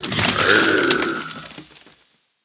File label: Mana Treant Death